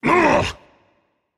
grunt hit rage; chaac

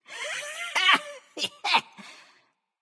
laugh; Smite; bacchus

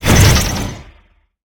staff fire; smite; ahpuch skin10

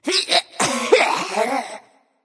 death; smite; voicepack; scylla

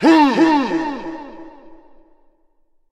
shout vox; smite; odin

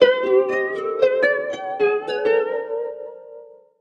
defeat lyre; smite; Apollo

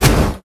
hit; smite; cerberus

